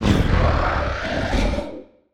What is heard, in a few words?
Humanoid Long Grunt, monster sounds; TheDeclineOfWarriors; Monster Sounds Volume II